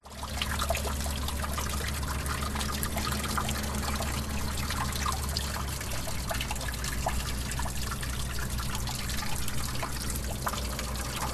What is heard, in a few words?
industrial harbor distance water drips trickle from pipe close by